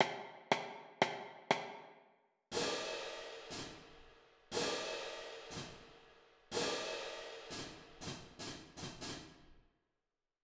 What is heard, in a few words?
jams (cymbals)